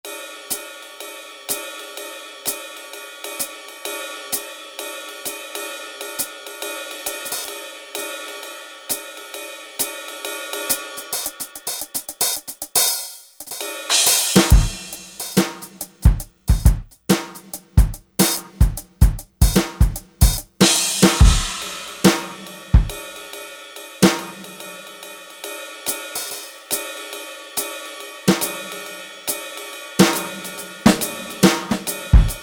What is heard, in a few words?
JAZZ KIT, Drum Kits; Generalmusic Equinox